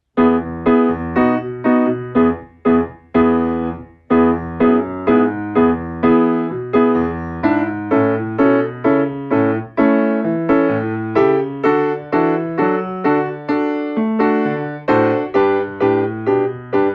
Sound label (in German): Klavier; Hausaufgaben